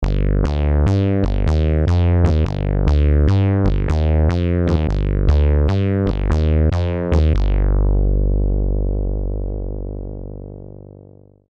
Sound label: Black Bass, Prophet '08